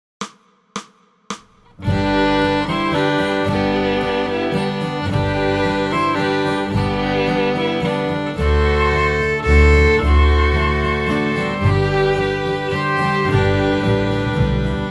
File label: Silent Night Bass All; christmas